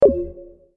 menu back; O2Jam+UUltimate

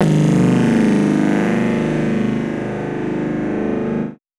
spinner spin; BlooXoo

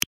menu click; summer pockets (pink)

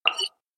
check off; Stargvze v2

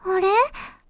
match leave; [Mirakuru] Hanasaka Yui Wangi Wangi

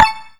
spinner bonus; Kurisu Makise v2.0

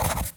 menu play click

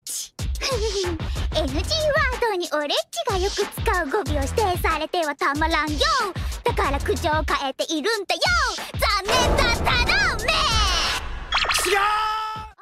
applause; Chika - 1.2.1

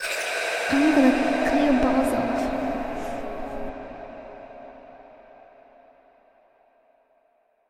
fail sound

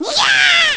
Section Fail; Taiko AC0 v4

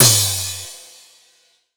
soft hit finish; Azer+ 2023

